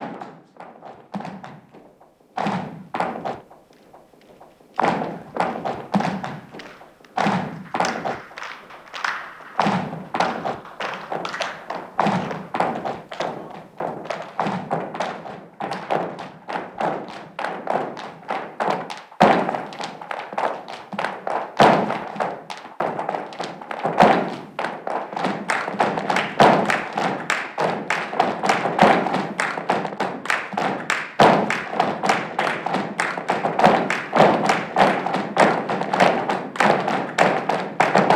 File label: flamenco; chafff